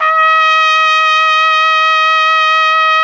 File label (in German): TROMPETE D#3, TRUMPET; Best Service; World Colours